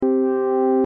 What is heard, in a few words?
organ For Meter Loop; wave interference